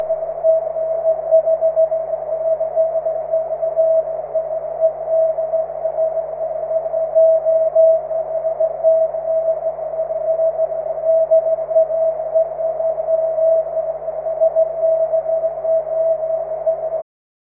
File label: cw; 23cm